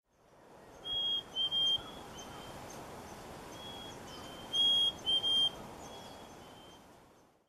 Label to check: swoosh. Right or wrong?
Wrong